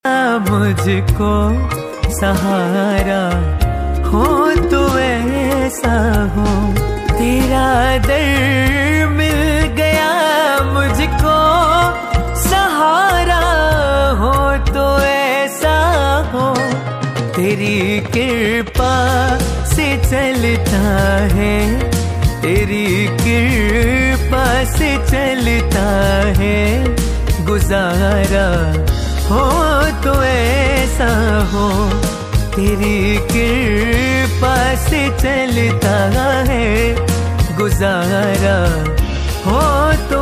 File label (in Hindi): Khatu Shyam Bhajan Ringtone